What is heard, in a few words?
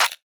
Lofi Clap; Cymatics; Lofi Starter Pack